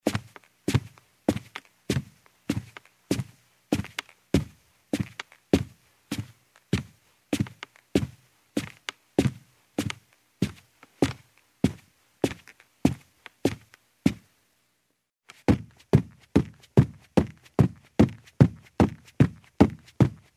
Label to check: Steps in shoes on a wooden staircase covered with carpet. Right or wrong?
Right